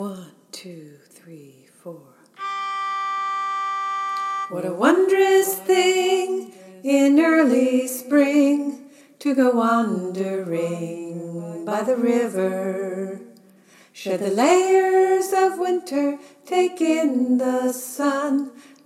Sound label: upper part, choir; The Freedom Choir